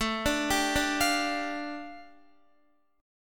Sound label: A 7th Suspended 4th Sharp 5th A Guitar, chord; arpeggiated